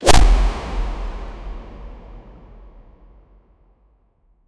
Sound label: punch sound; fighting